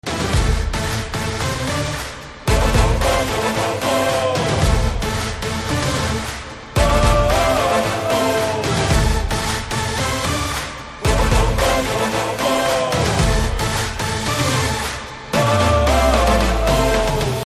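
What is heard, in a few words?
background music Ringtone; Ruler Motion Poster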